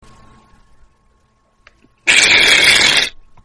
farting

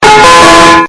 gong loud

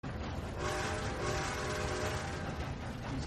train whistle; rdr2

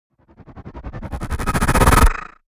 cinematic whoosh stutter

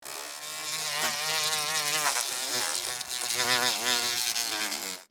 beetle flight

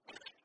slap

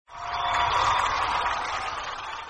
loud applause

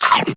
bite scratch sound

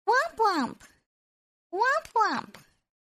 womp womp sound effect

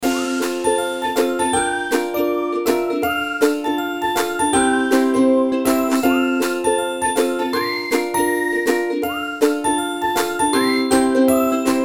funny frenzy loop

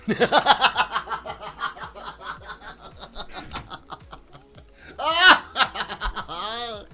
wild joker sound

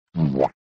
womp; sponge bob

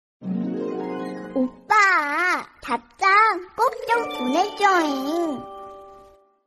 cute korean baby sound

